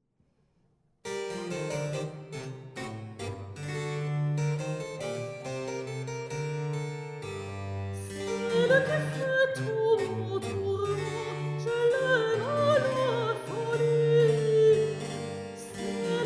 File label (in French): baroque couplet soprano; airs sérieux et à boire